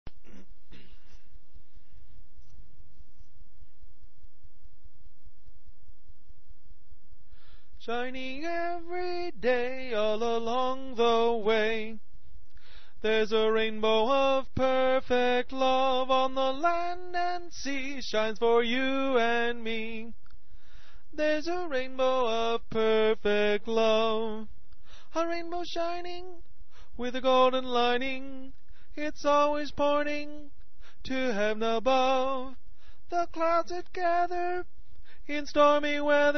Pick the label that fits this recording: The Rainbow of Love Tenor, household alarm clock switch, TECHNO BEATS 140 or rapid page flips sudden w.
The Rainbow of Love Tenor